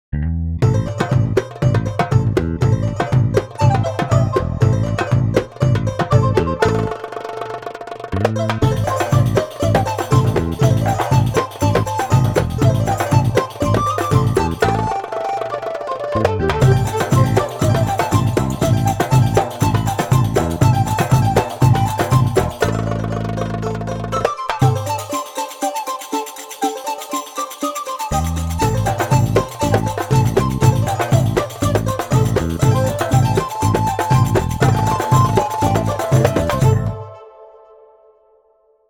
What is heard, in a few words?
Ethnic Energy; World